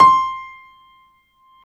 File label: Piano Grand; CMI